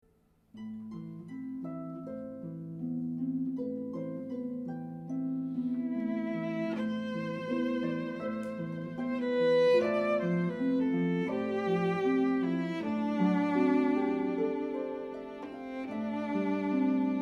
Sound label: Lullaby